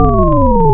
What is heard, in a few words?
Harmonic Descent, wavetables; Animoog